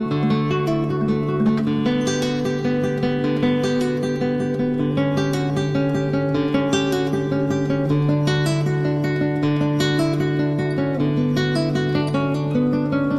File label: Cello Suite No. 1- Prelude